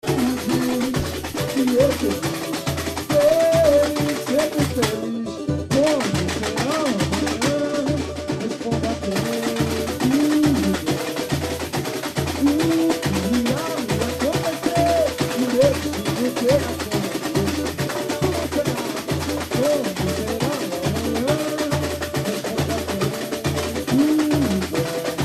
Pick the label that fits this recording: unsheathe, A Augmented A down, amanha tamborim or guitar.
amanha tamborim